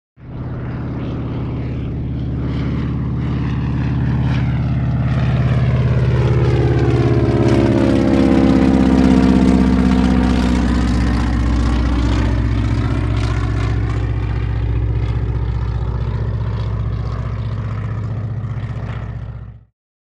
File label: Low, Rich Engine Drone, Slow By, Engine Chatters With Away. Medium To Distant Perspective. Prop Plane.; Broussard: By High; Broussard M.H. 1522